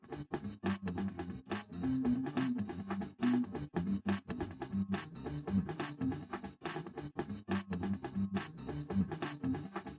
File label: wdrums; SiSEC10; underdetermined